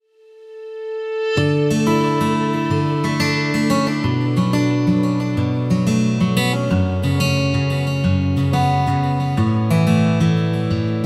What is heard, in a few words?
Acoustic Guitar Lirycal